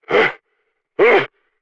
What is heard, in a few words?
die